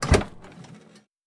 Uh-1H side door open; Aircrafts; DCS

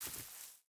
footstep hide grass walk; Rust

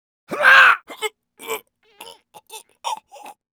terracotta front lose

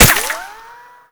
impact dirt; weapons; Modern Warfare

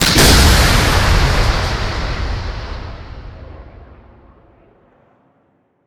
Missile Shoot; Weapons; DCS

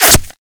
impact flesh; ModernWarfare

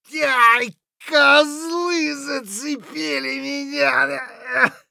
hurt light; Escape from Tarkov; scav6

